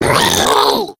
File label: female boomer spot prey, voice, attack; Left 4 Dead 2